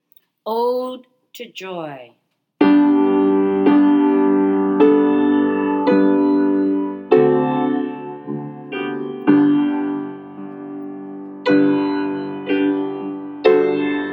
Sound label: Ode to Joy piano Accompaniment